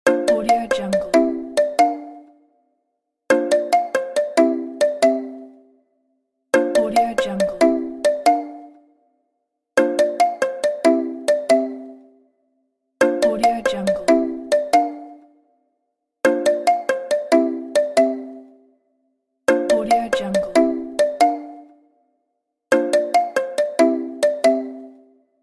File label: Iphone 6 sound